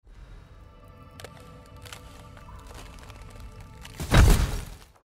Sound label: ice crackling; gris